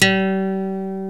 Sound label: BASIC ENSMBL; OMI - Universe of Sounds